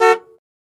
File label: Jeep Chrke Horn Honk; jeep cherokee 84